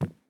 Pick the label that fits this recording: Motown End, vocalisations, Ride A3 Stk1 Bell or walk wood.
walk wood